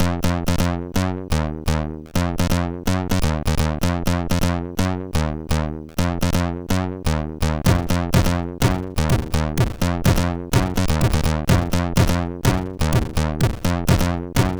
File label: Old Techno, MOS6581R3; sid